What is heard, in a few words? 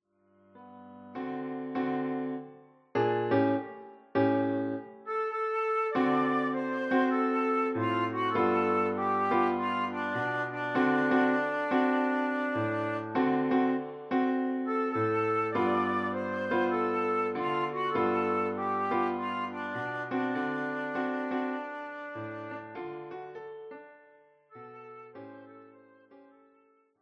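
flute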